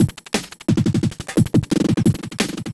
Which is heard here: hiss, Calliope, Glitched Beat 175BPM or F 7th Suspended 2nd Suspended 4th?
Glitched Beat 175BPM